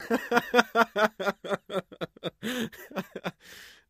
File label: Laughter Male Chuckl